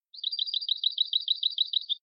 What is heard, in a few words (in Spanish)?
campanilleo